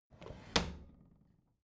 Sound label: bathroom drawer close regular